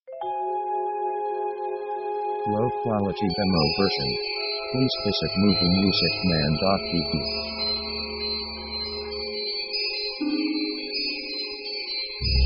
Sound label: orc spooky; Action themes